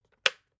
water boiler switch off

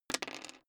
dice roll table clatter

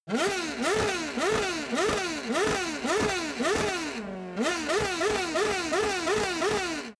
racing car f1 rev close

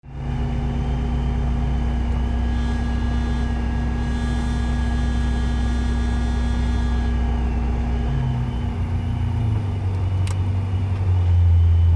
motorized glider engine stop

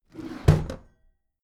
packed drawer smooth close heavy halfway

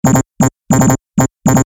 console control

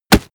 punch mid tight

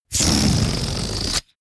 balloon air release fart

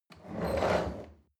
chair wheel roll on floor medium squeak

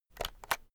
keyboard external shortcut medium

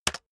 keyboard external space hit very fast

